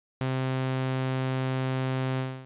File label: MSEG sine; Alchemy